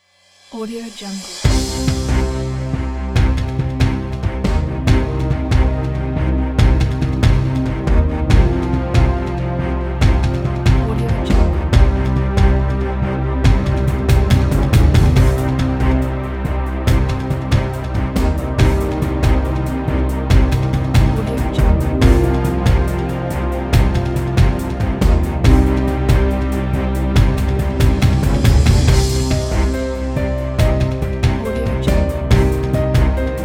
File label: dramatic cinematic trailer